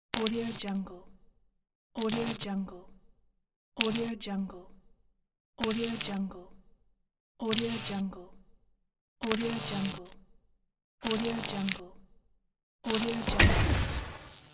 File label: electricity sparks